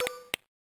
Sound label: PC Open; Game rip; MiSide